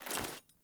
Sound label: Foley Run Default, Clothing, Player